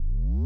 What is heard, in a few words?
chargeup, Retro Game Weapons Sound Effects